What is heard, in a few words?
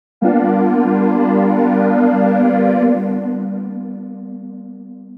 Techno x0x State; jup8